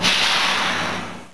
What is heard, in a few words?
brake; seibu HSC